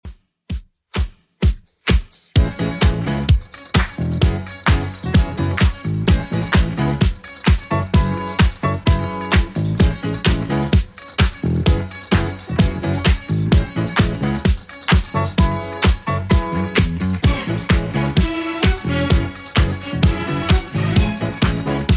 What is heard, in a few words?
70s Redrum 129bpm